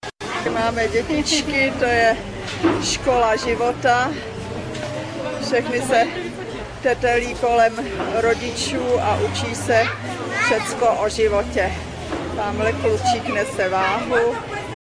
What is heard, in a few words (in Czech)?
Quito trh deti; Video Ekvador